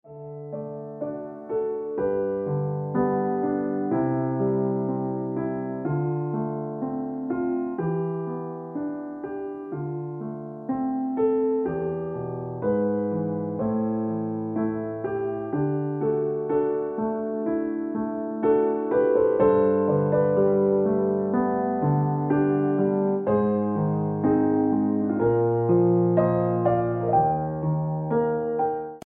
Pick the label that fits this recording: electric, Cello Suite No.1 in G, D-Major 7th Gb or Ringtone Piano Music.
Ringtone Piano Music